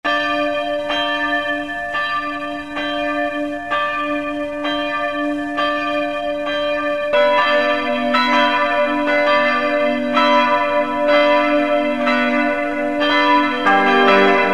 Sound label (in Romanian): Sol1 Si1 Re2, clopote